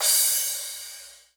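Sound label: cymbal hi crash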